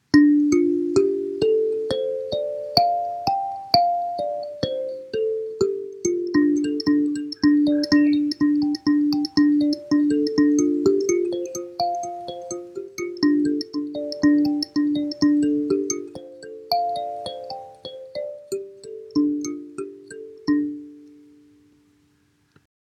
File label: Kalimba 8 Tone D minor pentatonic